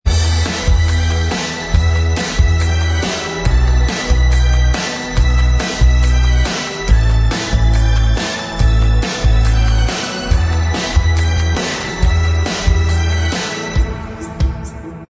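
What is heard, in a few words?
Instrumental Track, backing tracks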